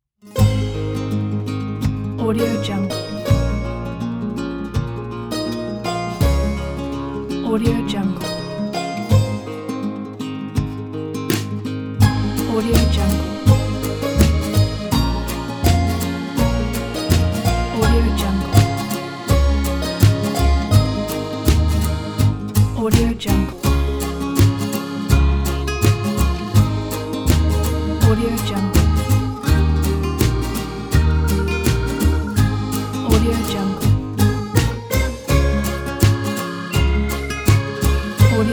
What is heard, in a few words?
country pop; sergey ignatov